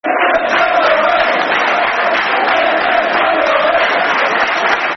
Black And White Army, Football Chants; Billy Davies; Derby County Dcfc

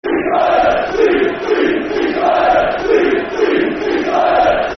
Sea, Sea, Seasiders, Football Chant (Soccer Song); Brighton Bhafc

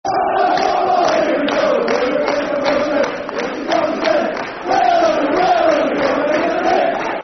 Football Chants (Soccer Songs); E I E I E I O Hull City; Hull City Hcfc

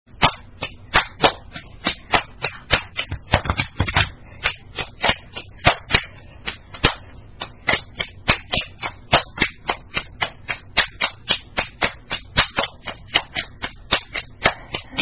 Rhyme Very Soft Degnerates Into Random Slapping; Apples On A Stick

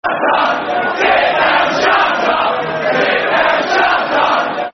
Sit Down Shut Up Football Chant (Soccer Song)

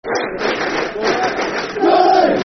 (Clap) Geordies Football Chant; Newcastle United Nufc

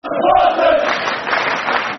Loyal Supporters, Football Chants; West Bromwich Albion Wba; fanchants

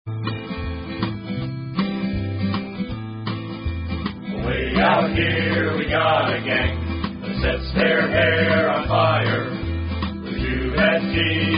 The Choir

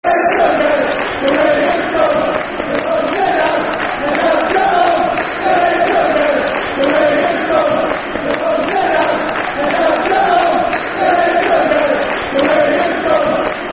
Wigan Scum, Football Chants (Soccer Songs); Bolton Wanderers Bwfc

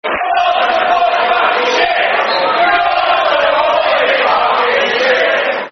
Football Chants (Soccer Songs); Your Support; Portsmouth Pfc

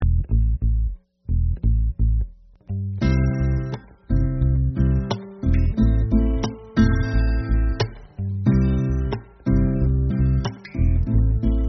Blues; Step Parent Blues

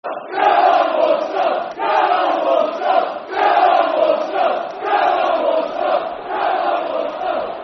Come On Walsall, Football Chant (Soccer Song)

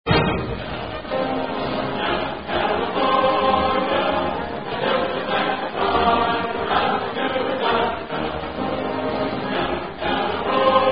California Drinking Song; Caldrink; Aka God Damned Dutch; kappa-nu

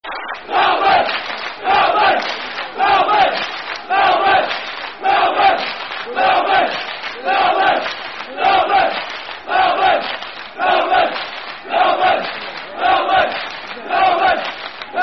Football Chant (Soccer Song); Melbourne; Melbourne MFC

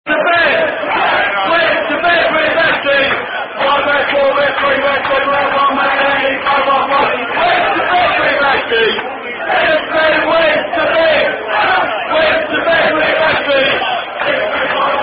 Football Chant; 10 Men Went To Bed With Ashley

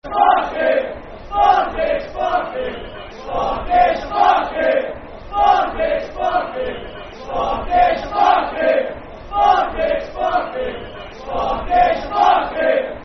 Football Chant, Soccer Song; That Boy Sparky; Livingston Lfc

